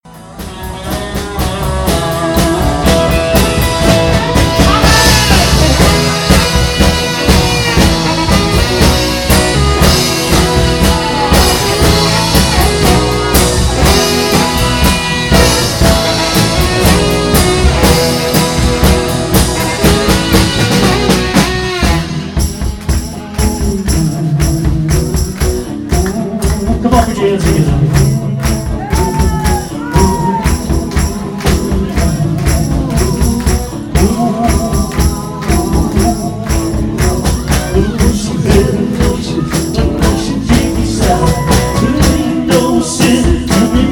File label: Sax